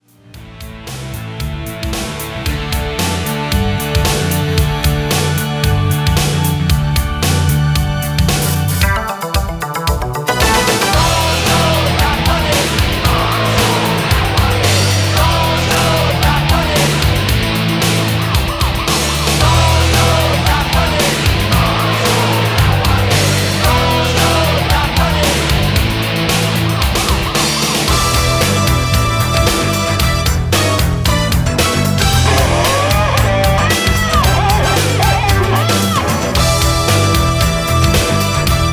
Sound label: Professional soundtrack